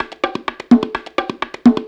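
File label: CONGA LOOP, Techno Trance; E-MU Formula 4000 Series Vol. 2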